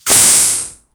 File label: small explosion then toxi, gas